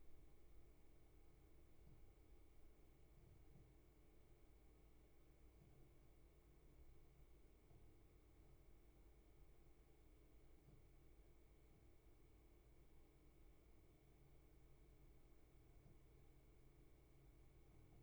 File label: roomtone Park